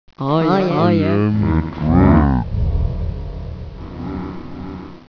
die, dragon

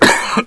male cough; jcdenton

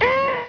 pain, houndeye

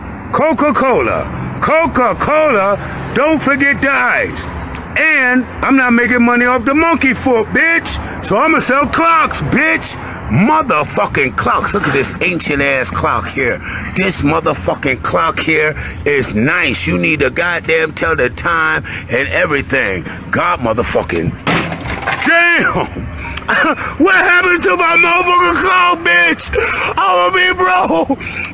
clock

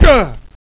player fall pain

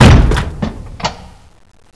door stop

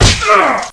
punch

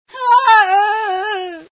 scream, female sci

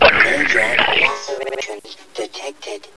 attack, zombie

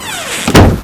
door wood close

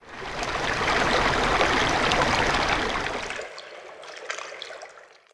scripted water flow

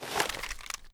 deploy, weapons USP

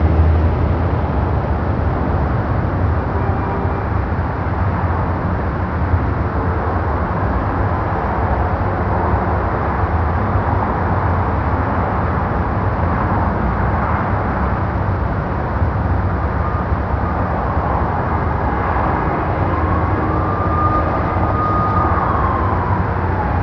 wind

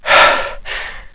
male breathe; jcdenton

